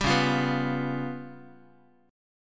C sharp 7th Flat 5th Guitar, chord; C#7b5; strummed; tuning=4A,4E,5A,5D,5G#,6C#

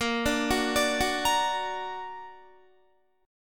Bb Augmented Bb down Guitar; arpeggiated; 4F,4A,5C,5G,5C,6E

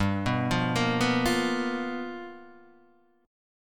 G 7th Sharp 9th G, chord; G7#9 Chord {3 2 3 3 0 1} arpeggiated

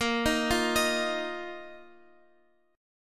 A sharp Suspended 4th A sharp down Guitar Open F; arpeggiated

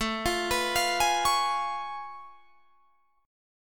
A Augmented Major 9th A down Guitar Drop D

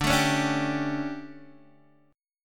D Major 7th Suspended 4th, Guitar, Open D

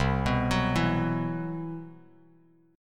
C Major Sharp 11th, Bass Standard; CM#11 arpeggiated; JGuitar

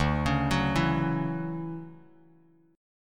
D-Major 9th D down Bass Standard; DM9; arpeggiated; JGuitar

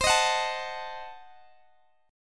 C Diminished 7th, Guitar DADGAD, chord

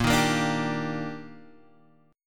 D sharp Major 7th

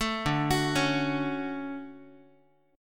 D Major 7th, Guitar; DM7 arpeggiated

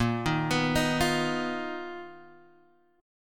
B Major 7th Flat 5th A sharp

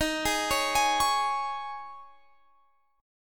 Eb 7th Suspended 4th; Eb7sus4; arpeggiated

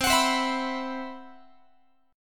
C Major 7th Flat 5th C, Guitar; CM7b5 strummed

